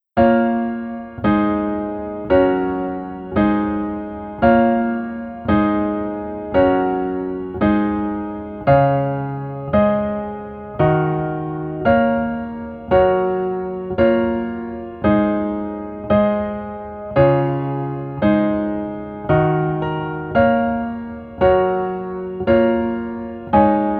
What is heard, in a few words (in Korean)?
Piano ver, 멜로디MR 여자키